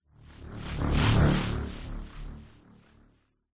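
science fiction power surge passby buzzing humming movement; zapsplat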